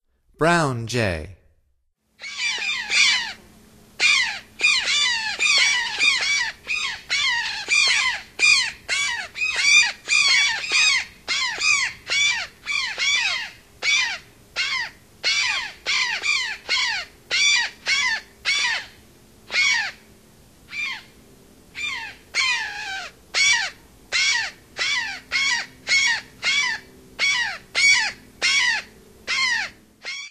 Brown Jay; North America; Stokes

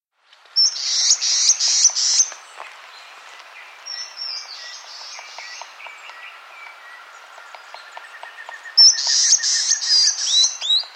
Rufous-Tailed Antbird, S. America

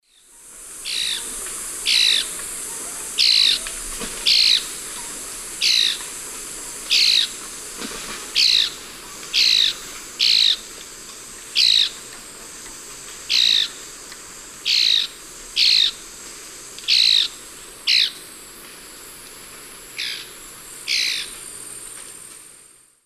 Pithys albifrons, calls; S. America; Eastern Lowlands

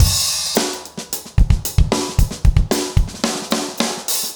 urban grooves 110

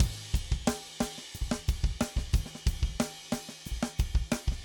funk 7 8 90; odd loops

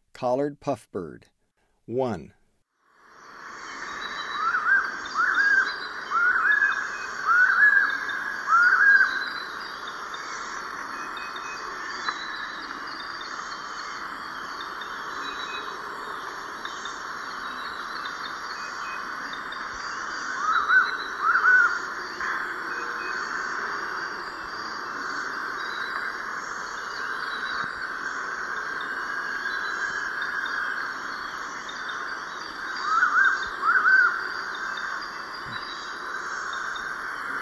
Collared Puffbird; S. America; Eastern Lowlands

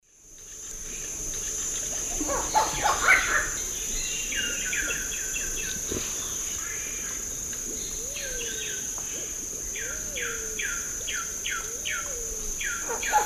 Heliornis fulica, call; S. America; Eastern Lowlands

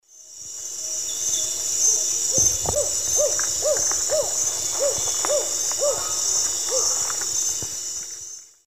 Nyctibius aethereus, cluck call; S. America; Eastern Lowlands

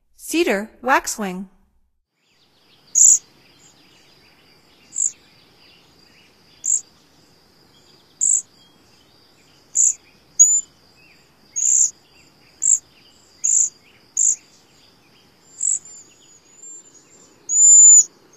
Cedar Waxwing, Songs of Birds of North America; Stokes

